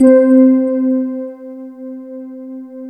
MELODIE C4, Synth

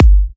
G, kicks; markus hakala kicks

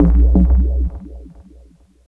SFX, 170 BPM, Drum & Bass; Ueberschall; Drum & Bass Flash Trackz

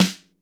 DRY S, drum; Crypton; DrumBase5000

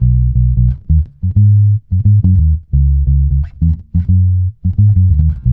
B, dub, bass; Spectrasonics; Bass Legends CD 1; JOY DUB

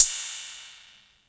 tr606 cym

